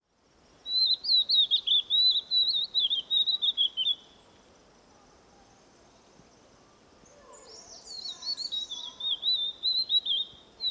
Chinese Vivid Niltava Niltava oatesi, vivid niltava call